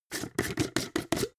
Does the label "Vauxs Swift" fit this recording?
No